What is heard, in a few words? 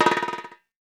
REPIQUE, Latin Drums; Latin Drums & Rain